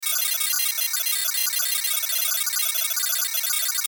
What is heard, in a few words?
DATA STREAM LO FI; Digital; Sound Design; Multimedia; Blastwave